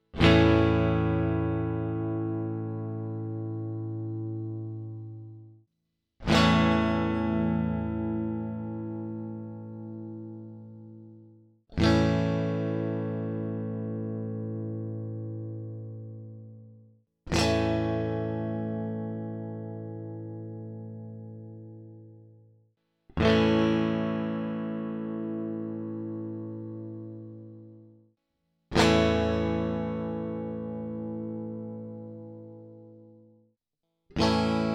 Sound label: Strat Neck Compare, strat conversion neck sound comparison